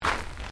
Footsteps Gravel Step; Crocs; Footstep Foley; Human

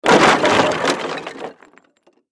Crash Wood Small; Sound Effects:Materials; Blastwave

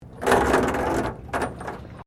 Chain rusty drag metal; Chains rusted from sea air; Dragging

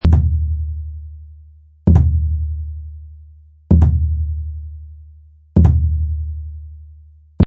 Low Hand Drum Beat 130

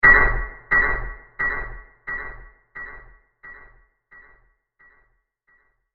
Sharp Metal Hit With Echo; impact sound effect

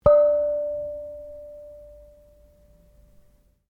GONG NIPPLE 4 INCH P, Very small gong; Cymbals (Sound Effects:Musical)

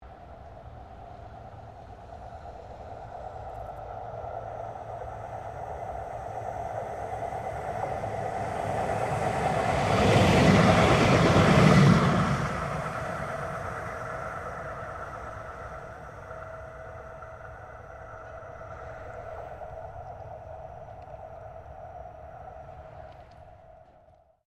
Tram Melbourne C Class Passing Long; Various Melbourne trams; Streetcars; Vehicles

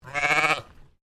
Animal Sheep English Leicester Baa; Sheep at Collingwood Children's Farm; Sheep (Sound Effects:Animals)

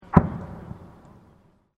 M2 Machine Gun Single Distant Fire; VRSonic

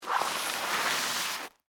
Scrape Crackers, Oyster, Debris; Texture; Scrape Sound Effects; Scrapes (Sound Effects:Objects); BlastWave

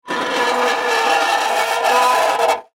Impact Metal Scrape Long; Materials; SFX Bible

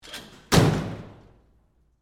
Door Close Gym, Foley, Door, Close, Gym, Annex; Miscellaneous Doors

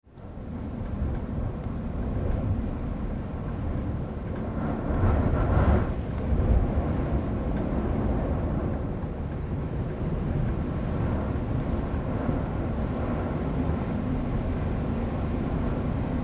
Wind Interior with Background Window Rattles; Gale force; Raging storm; Some strong gusts; Wind Sound Effects

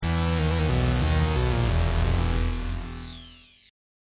Hip Hop Synth Licks 90 Bpm E Straight; Coffin; Royalty Free Synth Loops

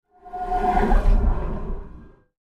Sinister Voices Transition, devil voice; horror sound effect

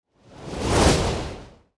Whoosh Metallic Heavy; Blastwave

